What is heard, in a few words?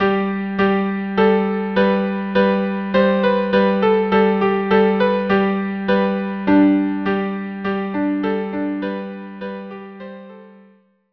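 violin viola; pastorella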